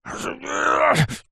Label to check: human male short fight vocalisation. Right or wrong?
Right